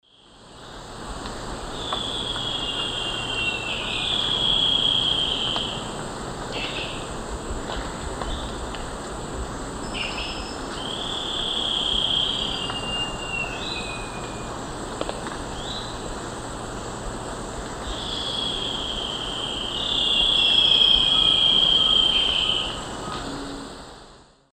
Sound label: Philydor erythropterum, songs; S. America; Eastern Lowlands